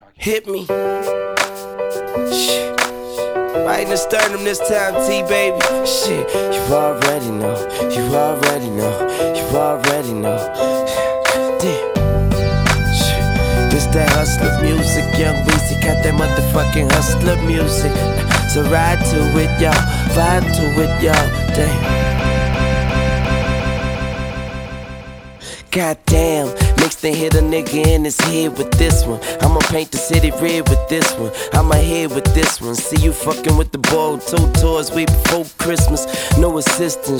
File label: office musik clockwork ringtone